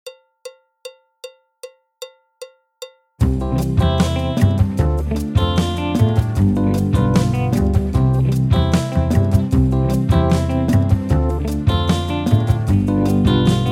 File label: Canadian Calypso BT, clarinet, flute, trumpet; Jazz Syllabus Level 2